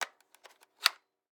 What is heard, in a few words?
X-Ray Machine; Blastwave